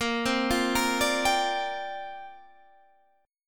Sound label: C 9th Suspended 4th A sharp